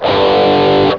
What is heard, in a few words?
Guitar 5th Low; Wayzata Technology; Instruments